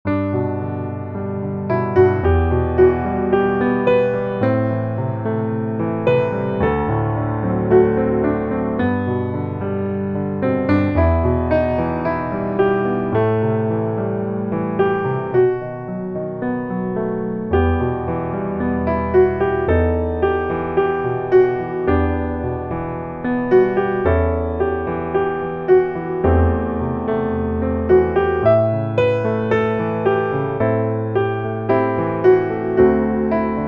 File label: piano